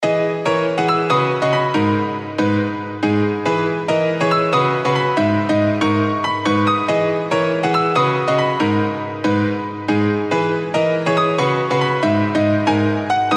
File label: Bounce